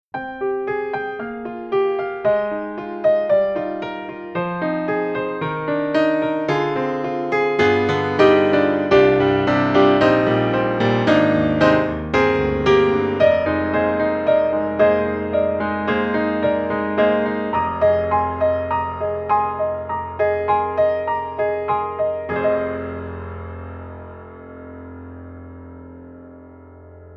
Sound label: Piano; Cinematic Studio Series